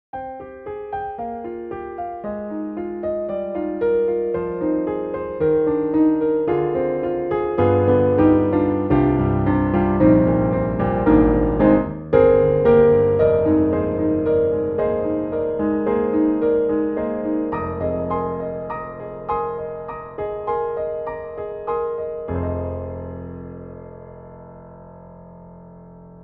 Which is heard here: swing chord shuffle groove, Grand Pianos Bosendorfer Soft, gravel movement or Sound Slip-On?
Grand Pianos Bosendorfer Soft